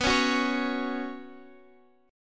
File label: B Suspended 2nd